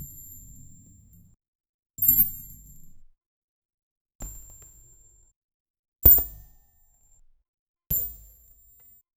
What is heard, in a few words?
powerful short soft heavy, armor